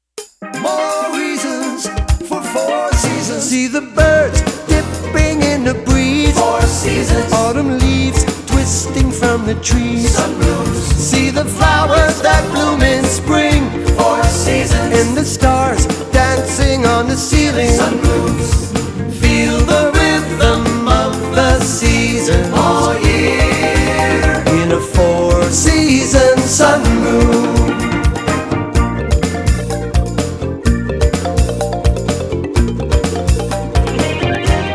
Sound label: Jingle; FourSeasonSunroom